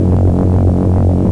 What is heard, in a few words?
Amb Force Field; Defiance